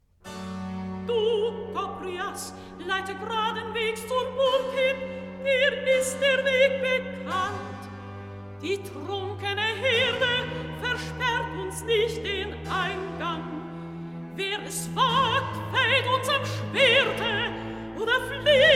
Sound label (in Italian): Recitativo; Cyrus; Du Gobrias; Handel Masterworks; Klassika